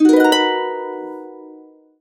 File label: HC BMAJ7, Complete Harps; USB Soundscan vol.62; AKAI